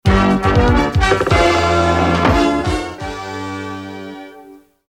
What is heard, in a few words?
music bumper